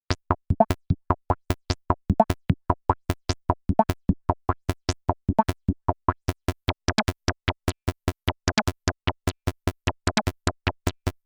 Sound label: Doepfer Dark Energy III XFM Source Jam